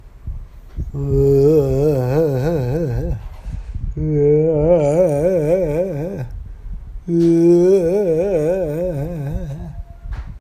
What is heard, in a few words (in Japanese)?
怨霊のように